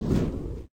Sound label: rocket; Fighter Aircraft